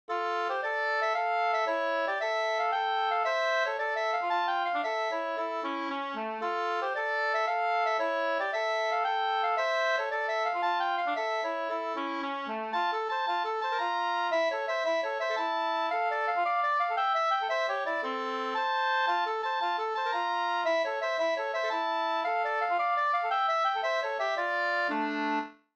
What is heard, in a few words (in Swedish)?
polska Dmoll